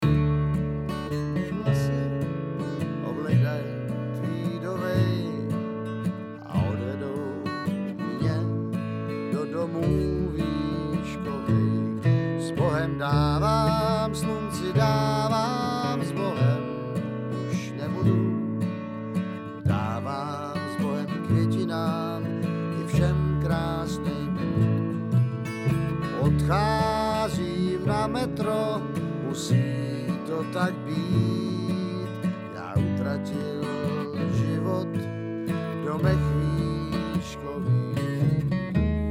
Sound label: vocLead, bluegrass